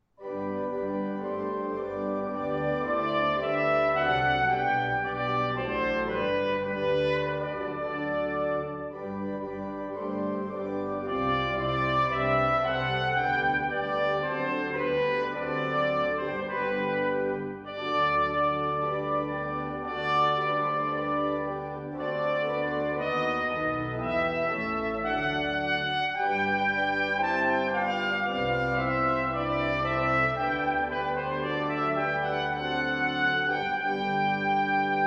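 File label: ode to joy trumpet descant and organ; weddings; beethoven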